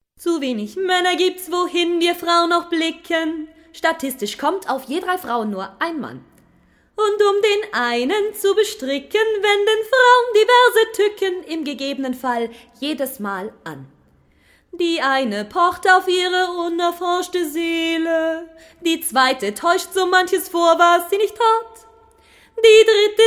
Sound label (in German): Gesang